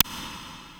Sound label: Moog PC; Moog Modular55